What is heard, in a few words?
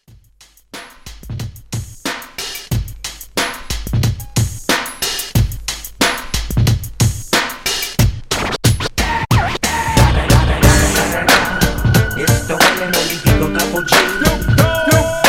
90s Hip Hop, 91 Bpm; ReDrum